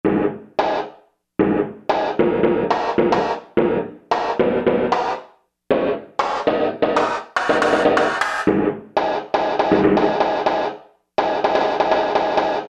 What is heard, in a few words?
Slap Hit, Korg MSC-2S Synth; Synth 1